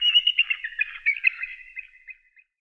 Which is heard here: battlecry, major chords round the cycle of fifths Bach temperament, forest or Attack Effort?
forest